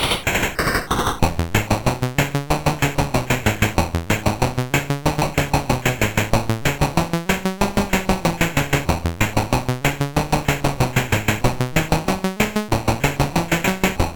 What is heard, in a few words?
sid CSG8580R5, GAMES; Foto Fitter